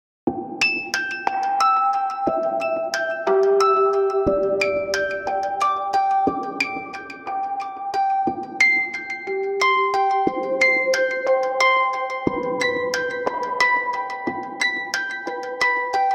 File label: synth